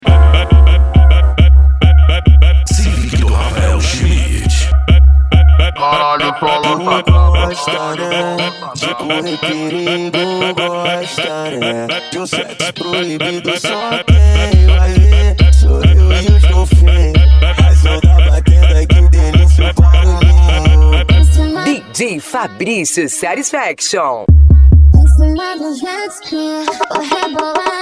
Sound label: funk mega bass